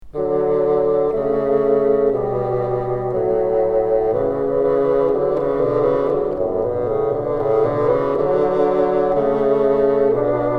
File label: together, bassoon